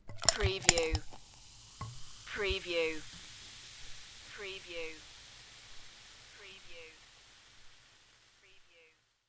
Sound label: Pour Soda Water, Fizz Of Carbonated Drink Into Glass With Ice Cubes